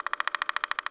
bird; DAoC; Toa

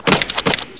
clatter

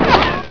reflect; Hexen II; mezzo

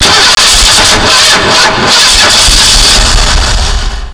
New Car

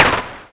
fire; OpenSebJ

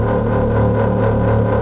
THROB

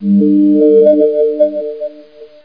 New Mail Notification; Hexen II

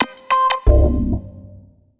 wrong

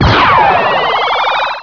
Pinball New Mail Notification; Plus! Pinball

